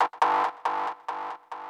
KEY SHOT TEKNO 140 A; Techno2; Singleshots

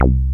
TB BASS; TB-303

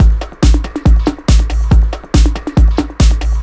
TECHNO BEATS 140

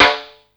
ragga snare drum; SNAREDRUMS

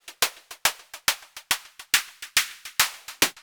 HIHAT TECHNO 140, Techno Drum; Techno2

